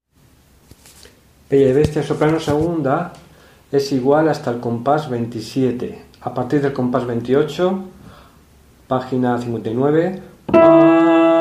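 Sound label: soprano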